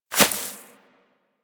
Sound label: Rodent Hop Step Rear Grass, Forest Rodent Foley Footsteps; Characters; Warframe